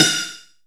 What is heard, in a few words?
Shock Snares, Percussion; Dance Synths